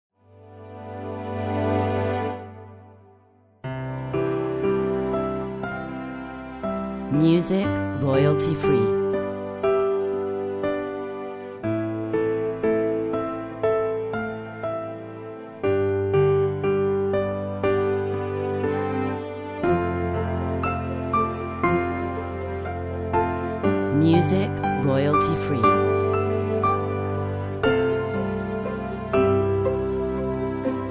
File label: Emotional piano long